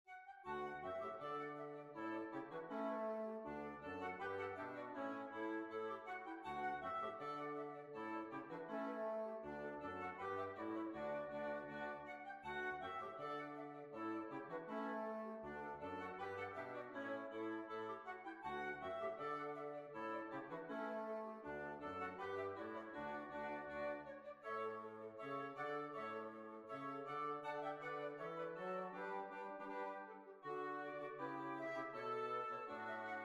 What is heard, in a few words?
Hornpipe